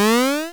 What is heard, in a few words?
jump